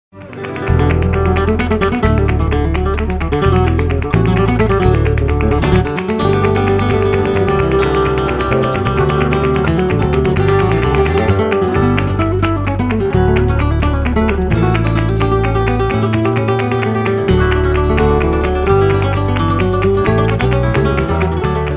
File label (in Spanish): Falseta, Flamenco